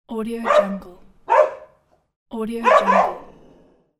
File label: dog